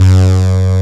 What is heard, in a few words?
SYNTHESIZER ANA LEAD; AKAI S6000 CD-ROM - Volume 1